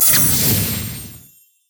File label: lux death; LoL